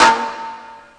hihat, drums

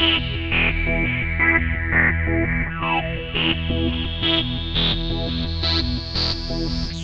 dwgs, korg

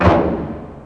snare, drums

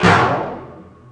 tom

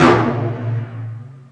tom

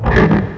noise

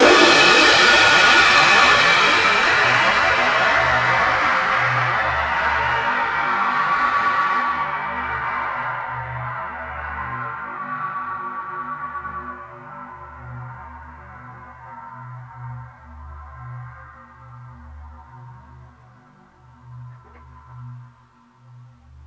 cym; drums

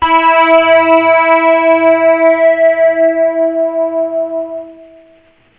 high e, guitar single notes

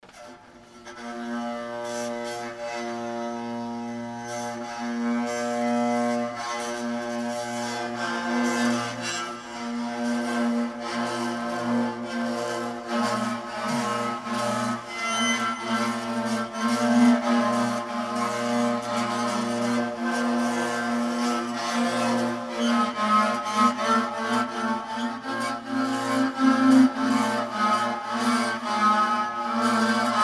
gnawing

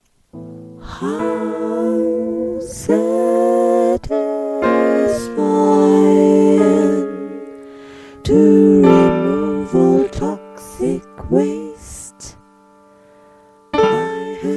piano; 12-tet

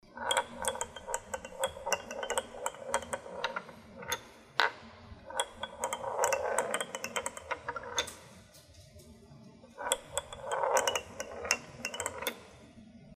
pinball

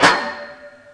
hi pdl; drums

